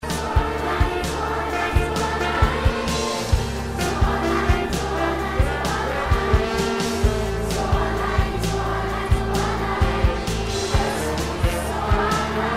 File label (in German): Chorstimme Allein tiefer Echo; Die Musik-Insel; 6. Tiefe Stimme Echo